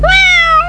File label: MEOW